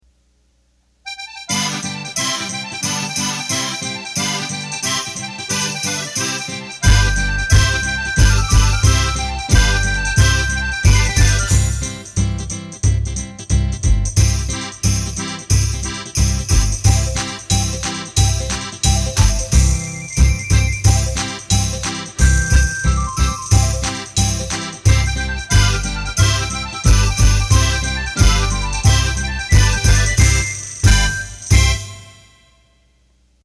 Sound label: Saltarel